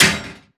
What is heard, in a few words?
frag physics bounce metalgrate hard; gmod; viper